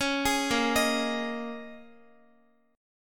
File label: A sharp Minor 11th, Guitar; arpeggiated; guitar chord